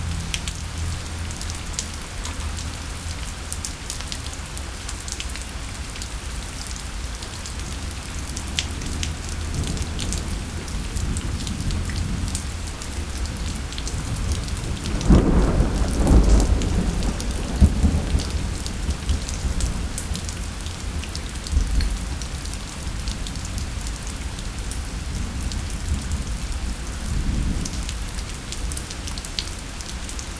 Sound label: forest rain